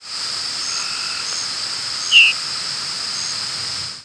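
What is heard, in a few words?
Wood Thrush; Thrush-like calls; thrushes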